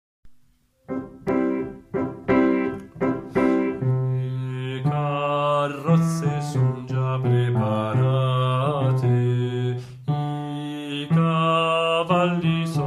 baritoni

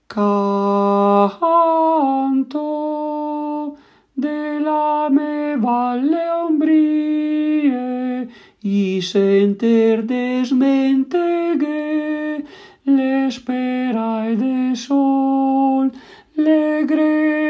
PRIMI

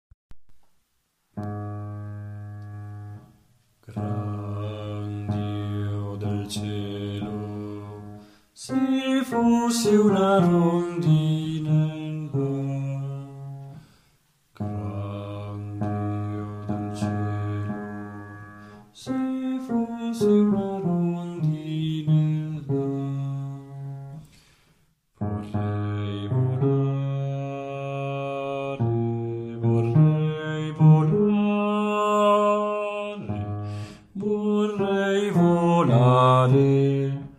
Gran Dio del cielo bassi

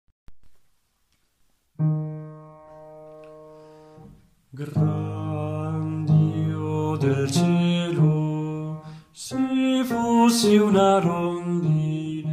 Gran Dio del cielo baritoni

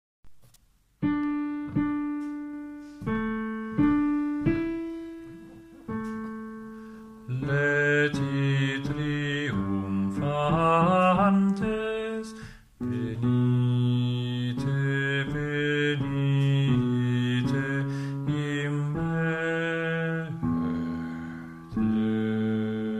adeste bassi; Adeste Fideles

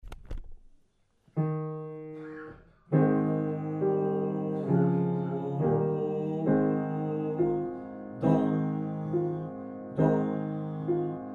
baritoni

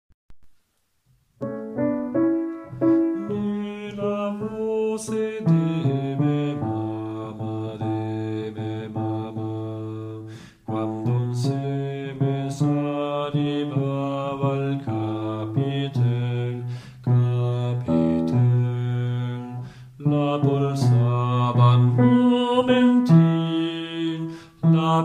bassi

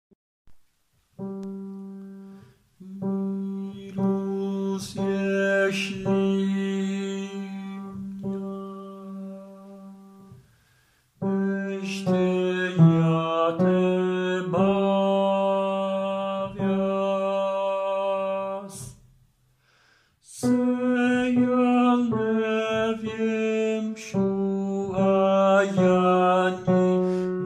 Laska opravdiva baritoni

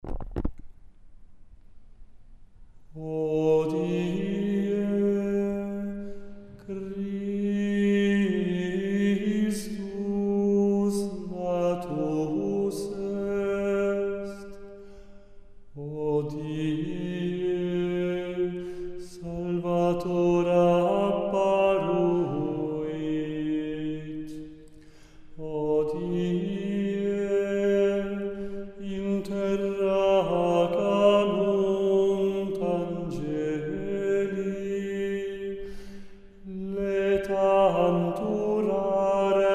per tutti

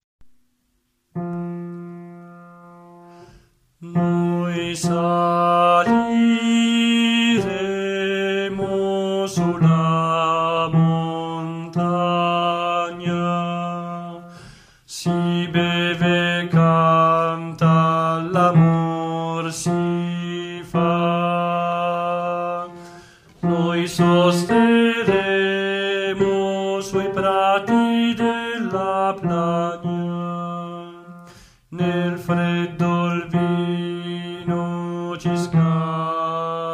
baritoni

